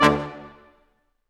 BRS Q, Quintet stac, Symphony Orchestra, Staccatos; Roland LCDP08